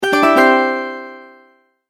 multimedia tone alert

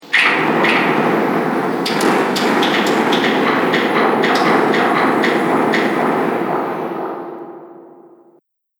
Dark Horror Hits; Miscellaneous Impact Sound Effects; Impact / Explosion Sound Effect

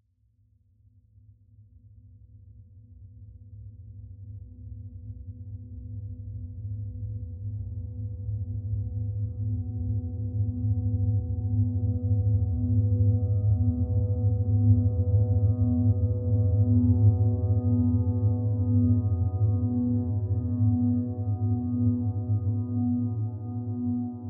Horror Dark Creeping Tonal Eerie Suspense; Dark Element; Scary Tones; Blastwave

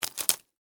Insect, Dig, Rock, Bug, Movement; Miscellaneous Insect; Blastwave; Animals:Insects

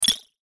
CLICK DIGITAL; Clicks (Sound Effects:Multimedia)

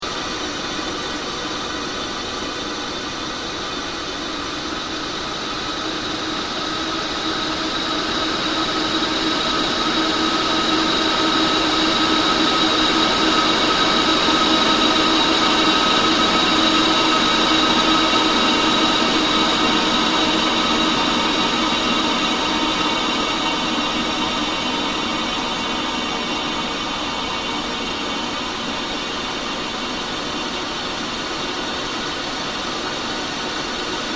Ambient Drone Slow Sweep

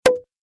Foley Champagne Cork Pop

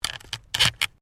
WOOD PLANK TWIST

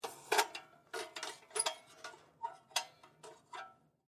Lantern Movement, Squeak, Metal; Foley; Squeaks; Objects

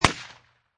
Machine Gun Uzi Model A Single Shot; Machine Guns

